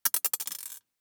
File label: DICE on Glass, Throw and Roll, Mini, 2 Two Dice; Samples; ArticulatedSounds; DICE Sfx Party (Extended)